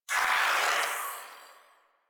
Shadow Vocal Attack; Warframe; Duviri

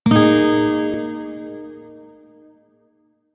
Shawzin Yo Chord; Warframe; Lotus Shawzin

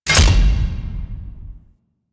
Attack Impacts; Ambience; Warframe; The War Within; Golden Maw

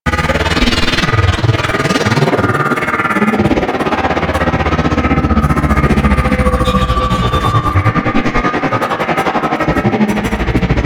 Object Orb Charge; Warframe; Infested

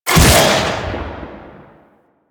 Shackle Cast; Warframe; The New War; Deacons

